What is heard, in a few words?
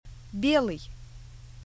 bell